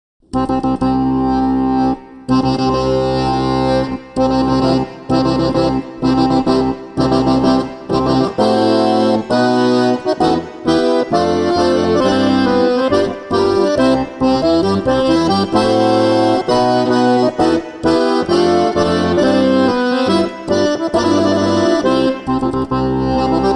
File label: Wedding March, accordion